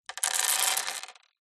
foley gemstones jewels handfull down on wooden surface